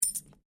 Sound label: COIN PENNY SINGLE DROP HAND; Common coins; Money; Objects